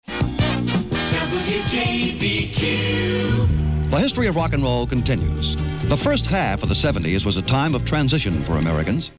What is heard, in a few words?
jingle; wjbq